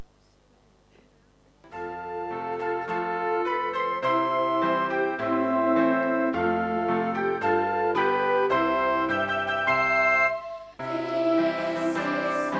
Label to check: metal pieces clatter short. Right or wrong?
Wrong